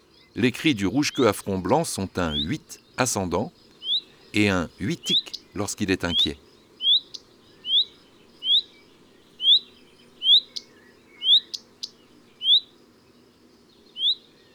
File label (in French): Rougequeue a front blanc, oiseaux des jardins